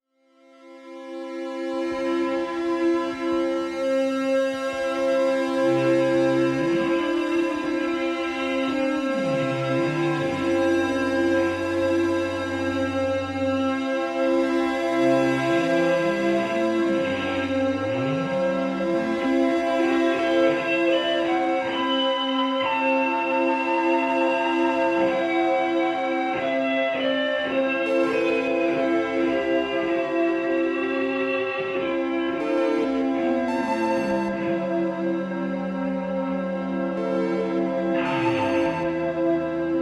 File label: Loop Solo; national art center ottawa 13 august 1979